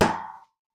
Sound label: metal